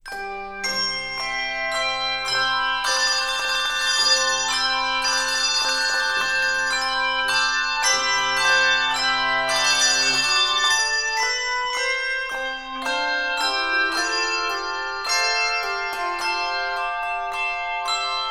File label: Handbells 3-6 Octave